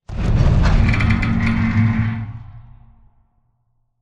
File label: crane move stop metal stress; Titanfall 2